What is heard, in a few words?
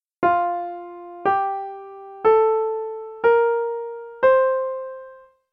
UP Treble F maj